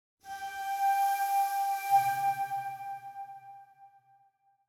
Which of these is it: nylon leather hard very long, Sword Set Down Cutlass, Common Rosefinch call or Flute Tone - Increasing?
Flute Tone - Increasing